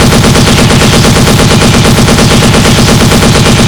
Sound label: lmg m249 shoot crit, weapons; tf2classic